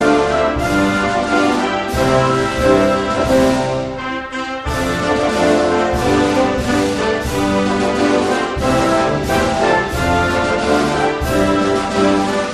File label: maldives instrumental ringtone